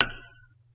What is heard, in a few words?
drip